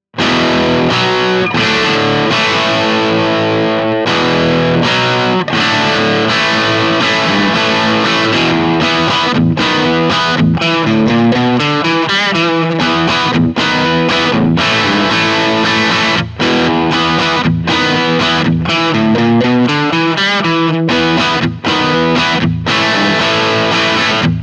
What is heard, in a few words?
rock chords; jensentone